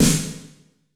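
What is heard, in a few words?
MIRROR Snare; Masterbits - Soniq Elements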